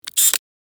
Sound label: Camera lock; shorts sound effects